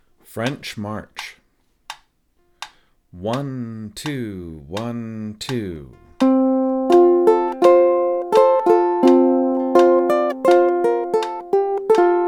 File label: French March